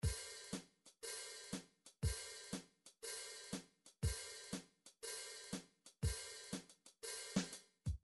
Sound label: midi drums drums